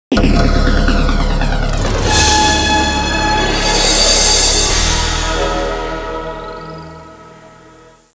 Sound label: music; Babylon 5 - Entertainment Utility